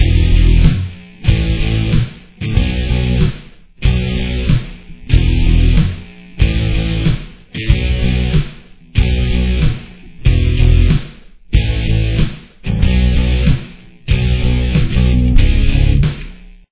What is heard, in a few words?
chorus, guitar